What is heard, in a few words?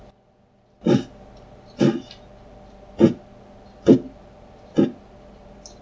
Dandy Puffing